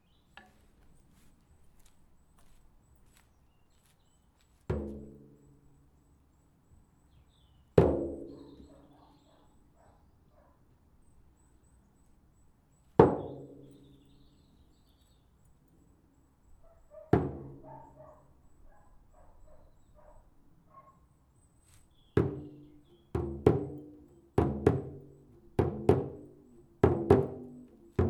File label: Drum Circle; Houghton